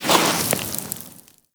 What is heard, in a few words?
Sword Elemental Fire Whoosh; Enemies; Weapons; Warframe; Teshin